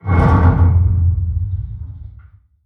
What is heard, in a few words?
Structure Crunch; Damage; Barotrauma; Room FX